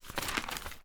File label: newspaper; transmission; raylib